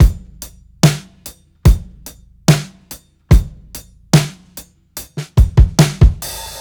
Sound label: FAT 145 8TH BEAT, DRUMS LOOPS; BASEMENT DRUMS VOL 2